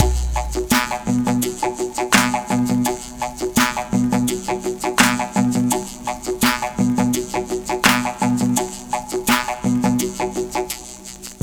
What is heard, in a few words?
DRM, Breakbeat; Best Service ProSamples vol.24; AKAI; SWEET 16